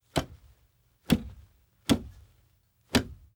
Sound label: Car Door Close x4; Fairlight Sound Lib